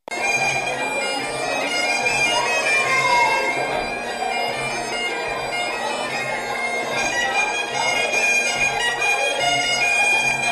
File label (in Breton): Ton doubl plinn; recordings made during Breizh en Oc animations